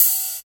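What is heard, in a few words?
OPEN HAT; East West - Phat & Phunky CD2; 90's Sample CDs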